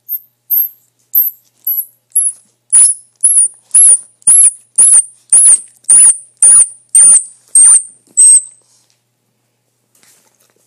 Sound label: Baby Squirrel Crying